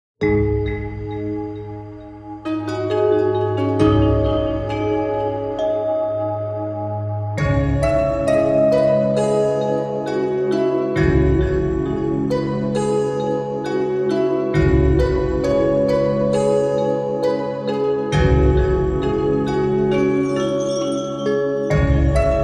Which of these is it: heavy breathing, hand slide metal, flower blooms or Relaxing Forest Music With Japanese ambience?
Relaxing Forest Music With Japanese ambience